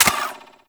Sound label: Braton Prime Fire End; Warframe